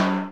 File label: Chimes; SNES; Young Merlin